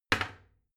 toilet seat slam regular; haus-garten; badezimmer

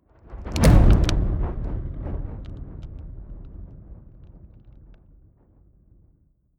fire whoosh long decay; swooshes-swishes

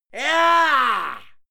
male fight scream yah; schreie; menschen; soundpool

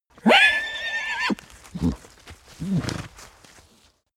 horse neigh loud; natur-tiere; pferde

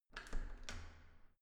entrance door handle use normal

